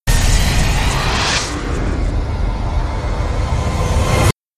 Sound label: Time Warp Sweep